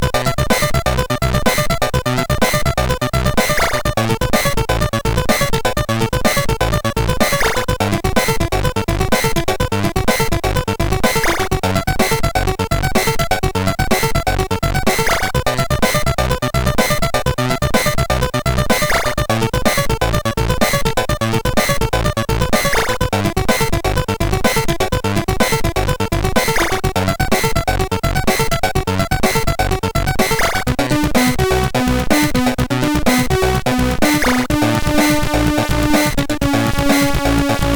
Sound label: sid, MOS6581R4; Food